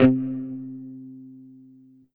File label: Korg M3R Rock Mutes C3